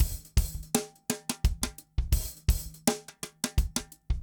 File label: funk rods 170; odd loops